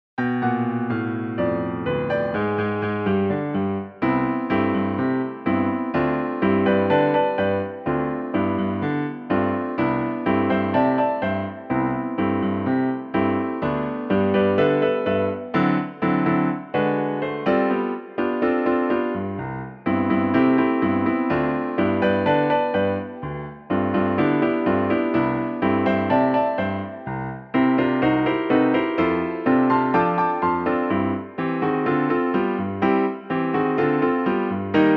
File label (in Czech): Zimni Cha Cha piano